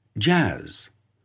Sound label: jazz